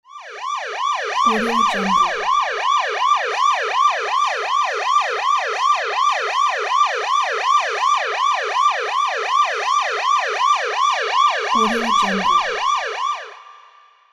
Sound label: police siren